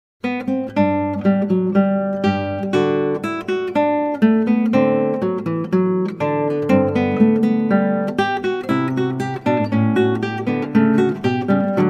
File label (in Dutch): Klassieke Gitaar; Bach 50 Solos